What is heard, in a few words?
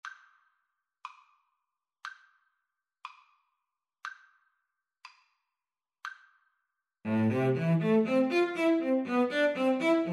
Viola Cello Duet